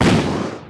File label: charging pierce